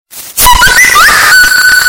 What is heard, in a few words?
android mega whistle